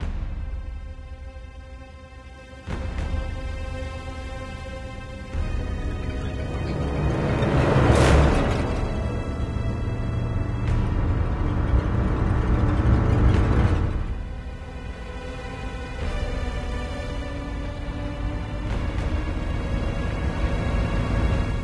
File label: music suspense; Secret Agent